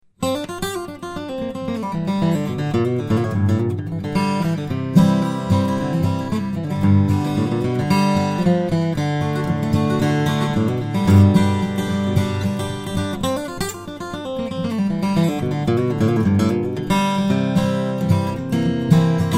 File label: Kragenbrink D-14, steel-string guitars, flattop